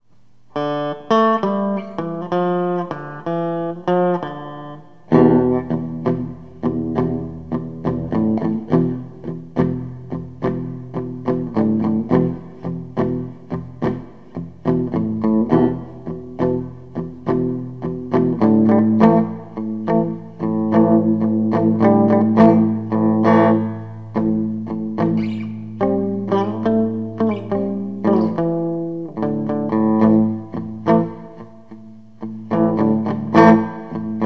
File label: tele crafter amp